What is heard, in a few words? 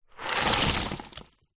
seashells tip into bin; foley